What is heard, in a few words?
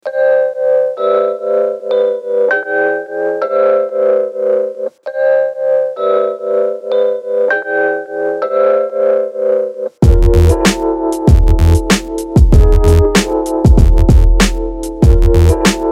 Buzzer (Instrumental)